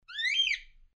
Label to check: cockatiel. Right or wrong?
Right